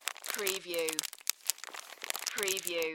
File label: Peanut Bag Plastic; sound-effects-bible